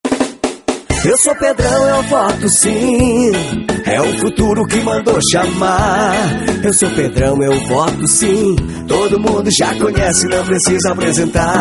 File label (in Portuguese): Jingle politico